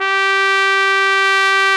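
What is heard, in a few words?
BRS, Brass Trumpet f; Roland L-CD702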